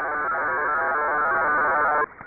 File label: fsk-1200